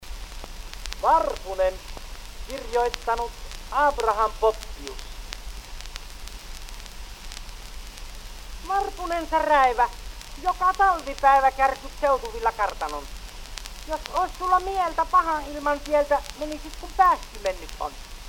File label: Gramophone